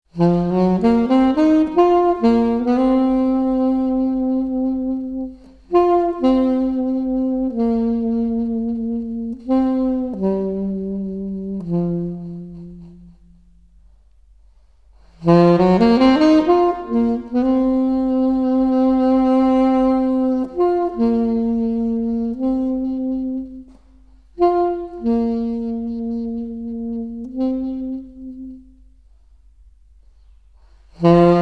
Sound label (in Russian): Saksofon; Leonid Desyatnikov